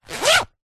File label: foley bag leather small handbag or pouch pocket zipper; zapsplat